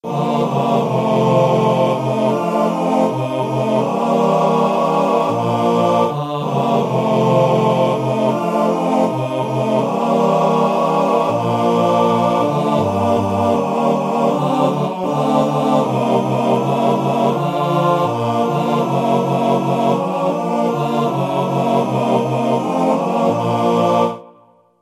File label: barbershop quartet